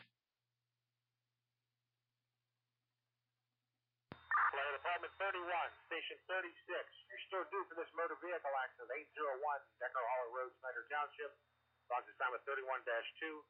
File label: bald eagle page received